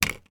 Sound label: paper cake case put down on table